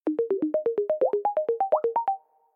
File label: Bubbles; Teams